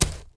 blaster drop dirt; objects; Star Wars Obi-Wan; XBOX

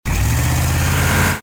accel, vehicles; Soldier Of Fortune II Double Helix; XBOX; ambience

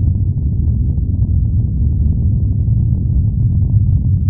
Space Ambience Loop

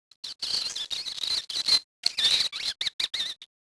Rat Idle; characters

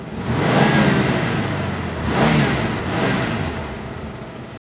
van revving; garage; Max Payne

